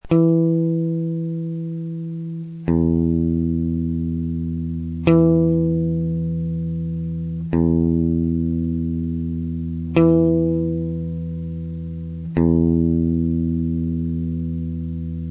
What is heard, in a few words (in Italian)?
mi basso; Musica